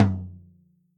Studio Toms Hit

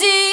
vocal

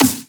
snare; osu

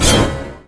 Sword Attack; osu

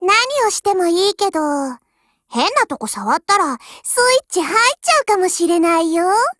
applause

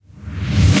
Reverse

